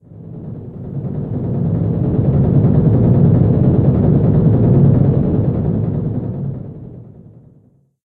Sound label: Rumble; Rei Yumesaki